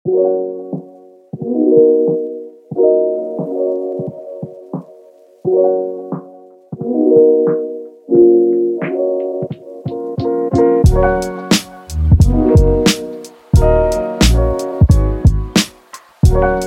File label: jazzy